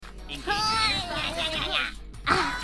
gnagnagna

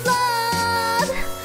blub

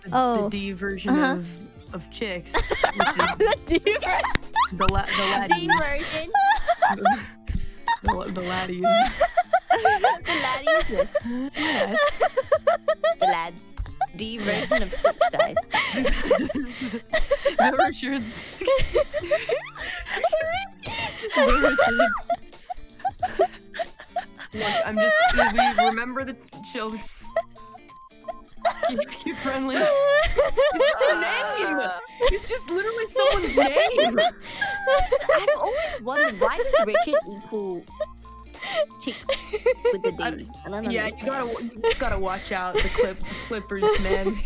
laughing; IRyS

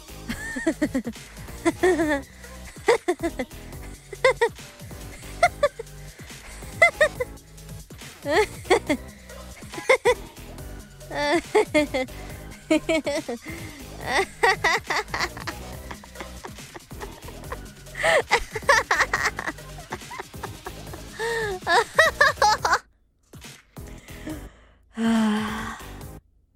crazy laugh

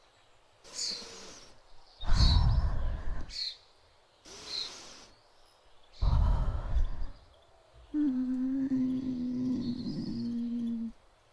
sleeping

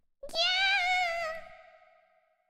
gyyyaaaaa, Scream; IRyScream

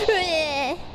bleh

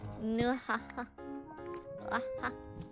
nuhaha

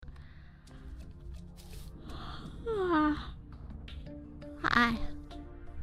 Yawn

